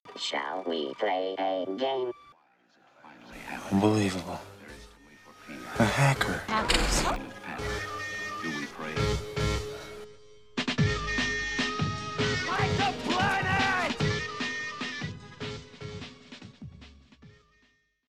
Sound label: Jingle